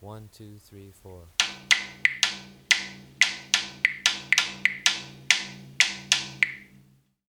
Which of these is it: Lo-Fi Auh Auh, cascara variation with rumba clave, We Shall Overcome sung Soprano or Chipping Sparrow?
cascara variation with rumba clave